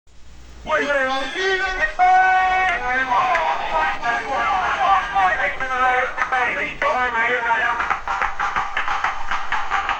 sound effect of victory; competition

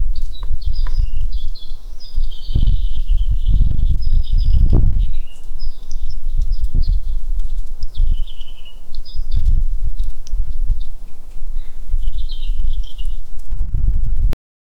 birds chirping rustling, forest

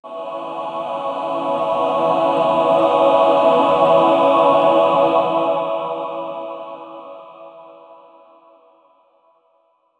a cinematic rising choir

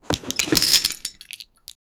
grab an enemy and whirl; character movement

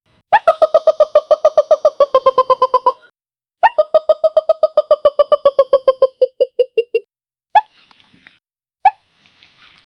cheerful jungle intro sof, clap

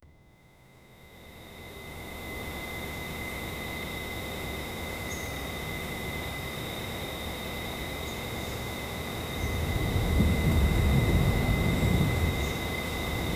nature night scene on a, temple

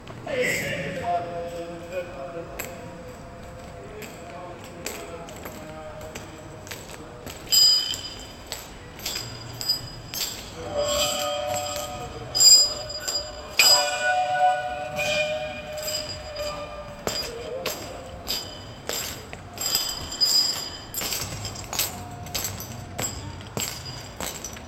ambient noise distorted voices

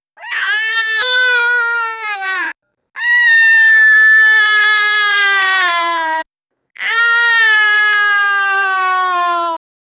bear crying in pain

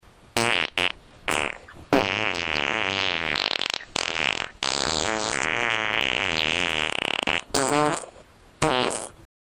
longest and loudest fart

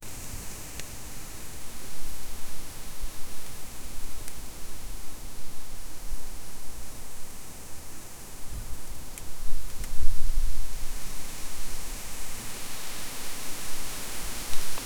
create the sound of a, branches

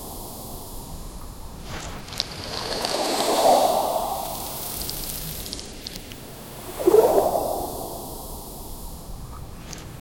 a perfect loop with consi, beach

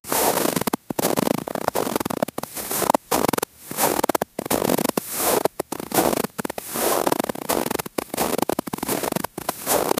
sit on the snow sound

